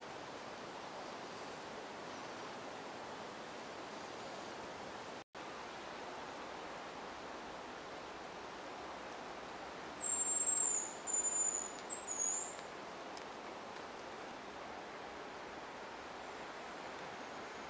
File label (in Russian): raspevnyiy posvist samtsa, ryabchikom